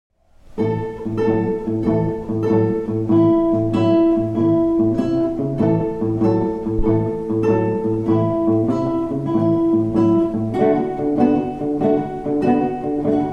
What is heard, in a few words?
guit blues